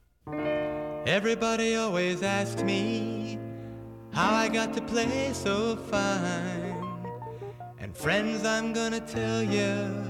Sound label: Piano Picker; Oldies